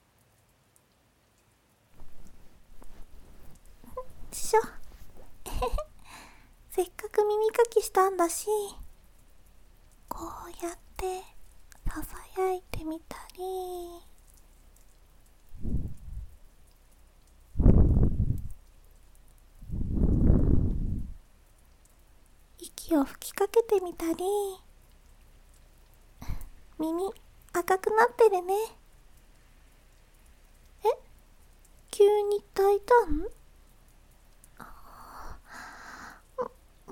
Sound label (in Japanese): 耳なめとキス